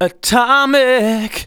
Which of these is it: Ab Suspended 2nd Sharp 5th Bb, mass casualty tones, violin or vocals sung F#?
vocals sung F#